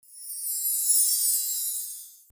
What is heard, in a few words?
hint collected; puzzleweave